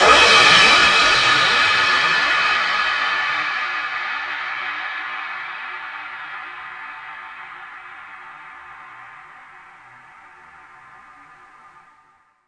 cym; drums

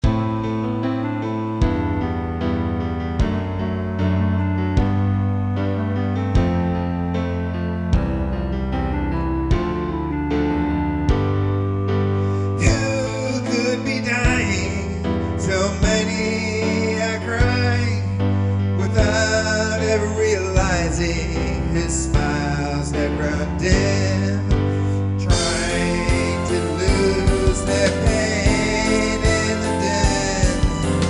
dying

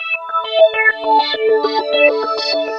dwgs, korg

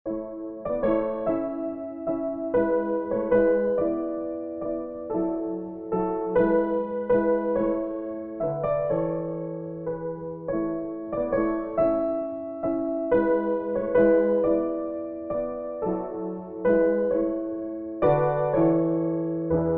12 vs meantone; 6th comma meantone

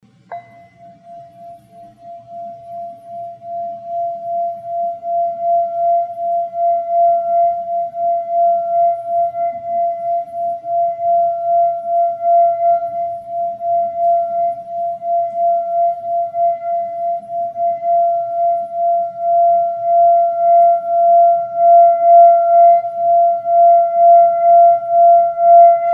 tibetan bell 4.5 inch

